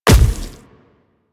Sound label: Splitter Step Default, Footsteps